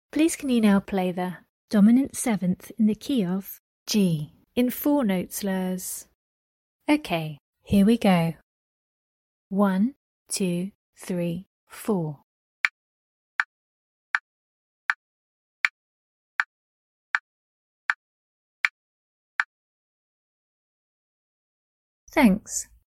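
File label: dominant 7th key of G in 4 note slurs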